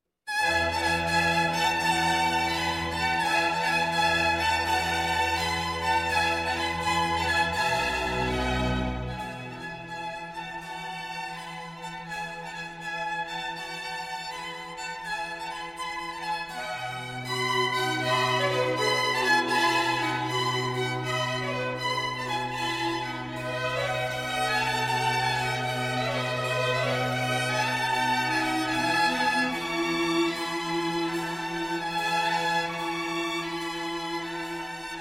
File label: Violin Concerto in E Major, Allegro; Op. 8 Violin Concerto No. 1 in E Major, RV 269, La Primavera. III. Allegro